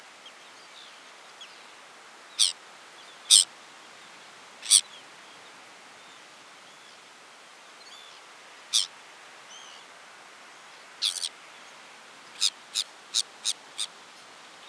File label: Sabine’s gull; JANMAYEN; sabinemake